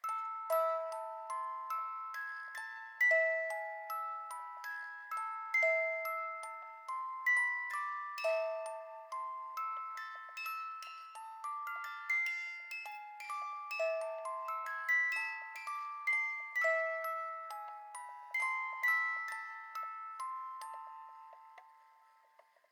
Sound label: music box; NS Studios - ultra sound collection